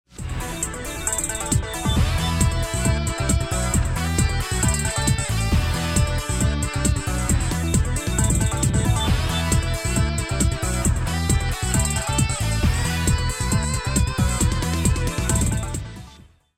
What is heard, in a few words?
start action; music kits custom